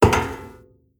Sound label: Metal Walk